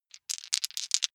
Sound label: big three rattle in hand short